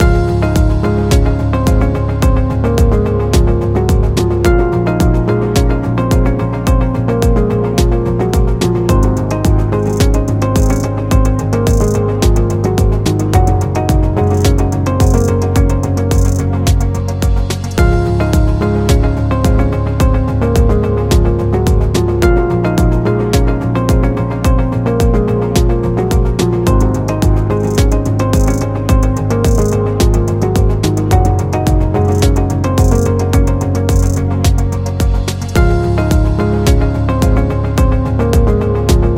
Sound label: Corporation Loop; explainer